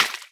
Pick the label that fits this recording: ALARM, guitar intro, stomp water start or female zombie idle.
stomp water start